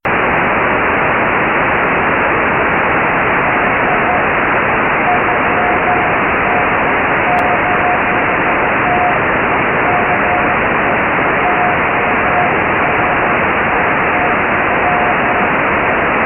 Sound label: 2400Hz